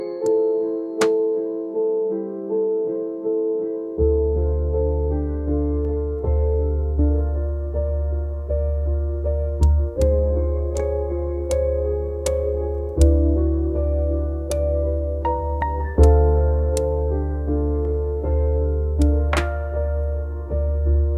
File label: calm lo fi